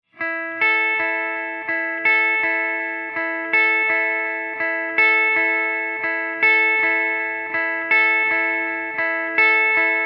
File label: Clean; REV100HD; Chuck Alkazian